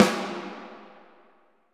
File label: SNR, Orch Snares; Roland LCDP03 Orchestral Perc